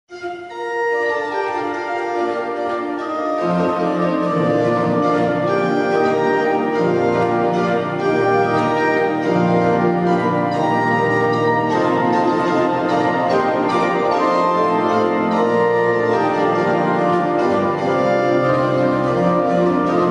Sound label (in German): Lobt froh den Herrn Orgel